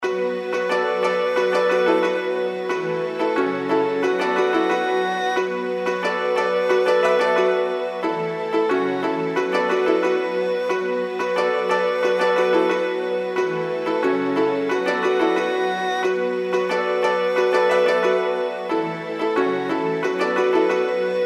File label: intro bgm; Daji; League Of Gods